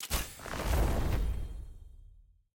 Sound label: hub book open; tftset16